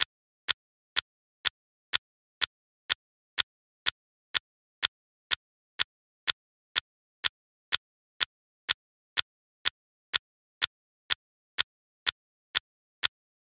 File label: watch tick; organisation of time metre